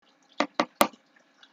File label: Tap Tap Tap